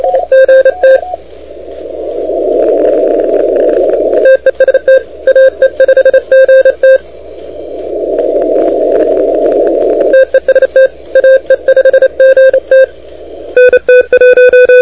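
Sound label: continuous wave 28MHz; ARRL10M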